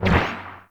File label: Dash Bubble Start; Warframe; Focus; Operators; Powers